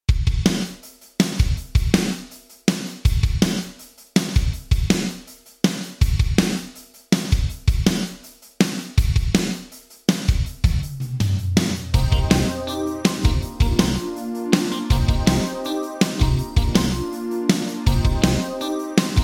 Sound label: Drums Sony HR MP5 Golden Gate; Vintage Digital